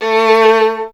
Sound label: STR VLN, Vln Detache; Miroslav Vitous